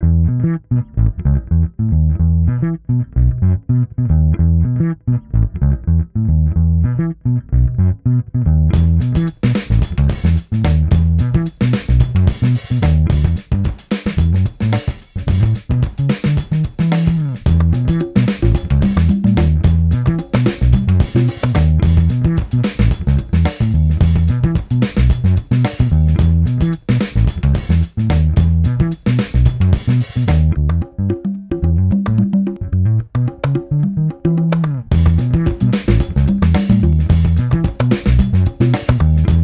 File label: African Senegal Afro Beats